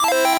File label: 8bit se